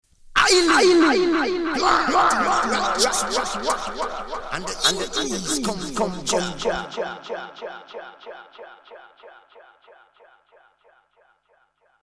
korg dist; shootout

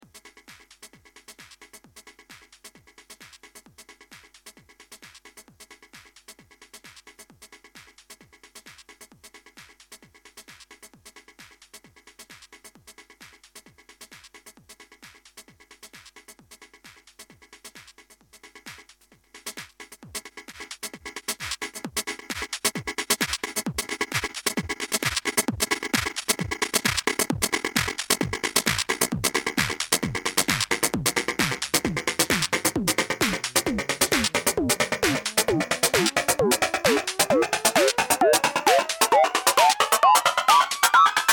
drumloop sweep real ring

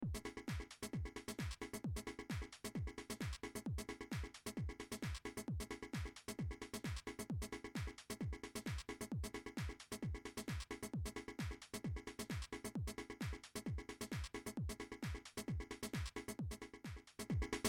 drumloop sweep

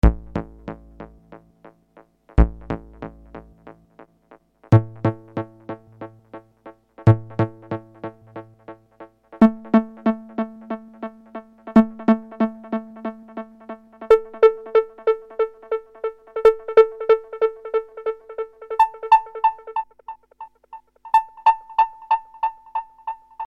korg lo; shootout

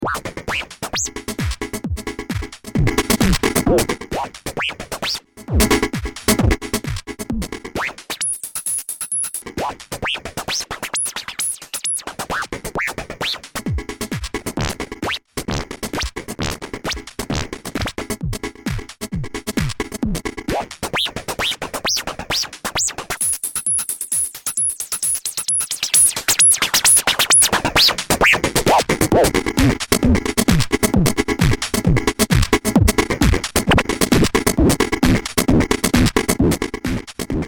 drumloop jam, ring

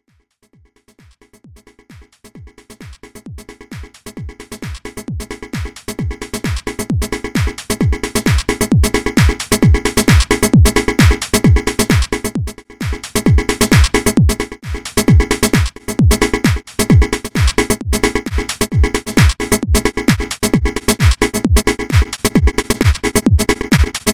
drumloop sweep ringer

